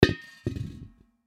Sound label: Propane Tank Down, Propane Tank Set Down Cement; Machinery; Foley